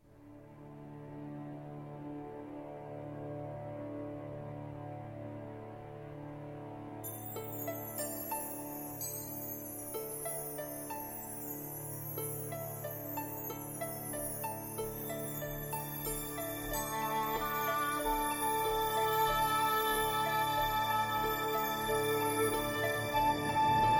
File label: Sinfonia, Strings Brass